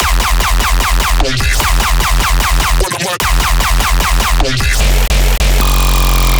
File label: bass loop stomping 150 F; Virtual Riot